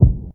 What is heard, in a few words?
good rap kick drum sample b key